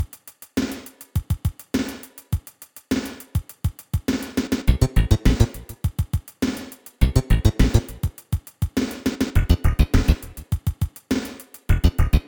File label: Underground BGM; SNES